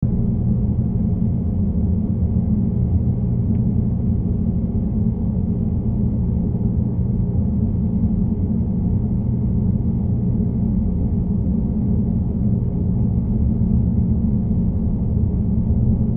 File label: HUMREC, Brummton